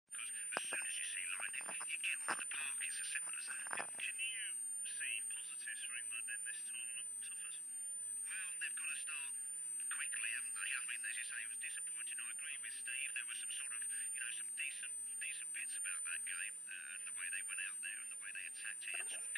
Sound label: crystal radio reception, on a simple crystal radio